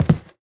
step, footsteps cardboard

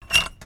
metal door move; doors

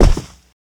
land carpet, footsteps; player

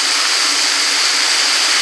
steam, gas

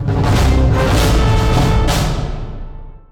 point captured, music

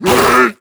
grunt hard, zombie vox; creatures

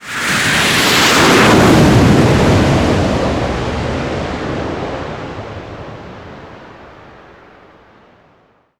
jet flyby; animation jets; Counter Strike Model Pack; CS:GO

